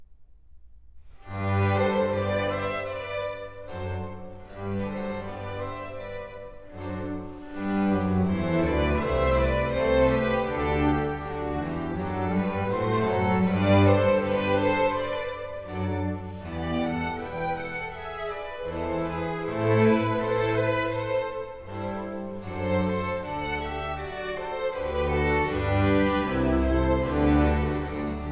Concerto in G Major for Viola Bassoon Strings and Basso Continuo; Avec douceur